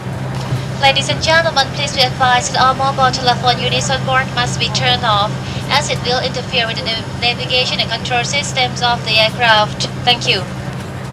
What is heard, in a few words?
airplane interior attention; best service Blue Box